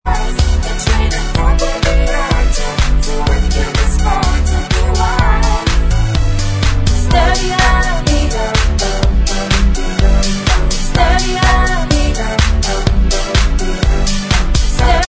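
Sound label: backing vocals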